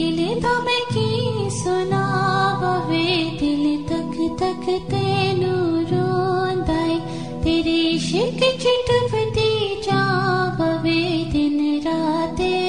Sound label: Indian Sound